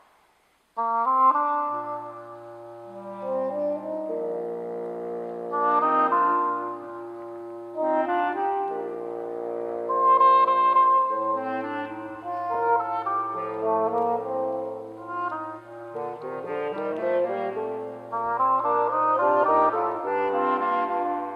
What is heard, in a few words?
Reed Quintet; Calefax; Rameau